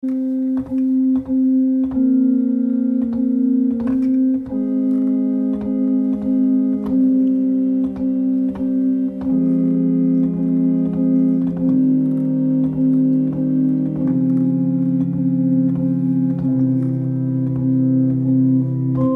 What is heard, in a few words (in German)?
Herzliebster Jesu Choralvorspiel; eg 81